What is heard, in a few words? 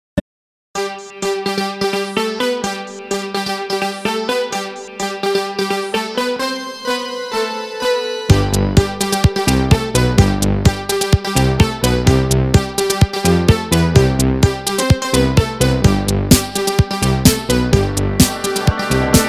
Mix, DJ; Mobile DJ